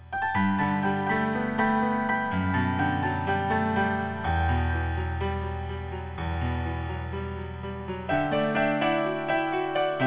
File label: music on hold